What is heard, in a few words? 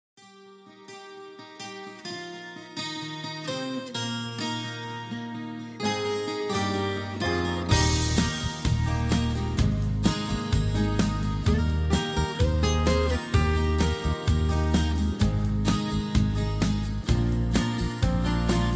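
Instrumental with No Melody with background vocals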